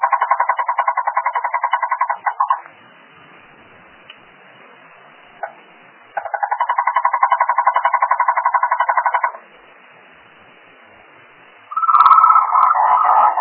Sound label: frog